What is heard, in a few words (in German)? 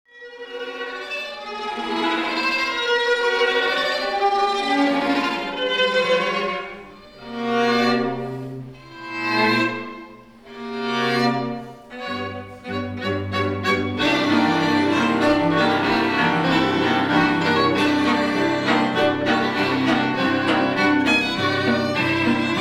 Streichquartett